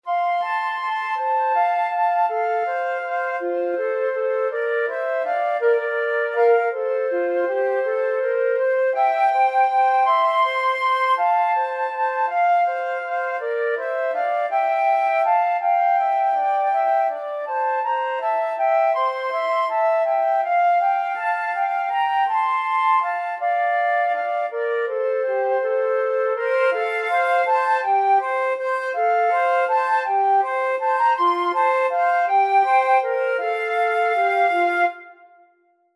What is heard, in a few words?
daisy flute duet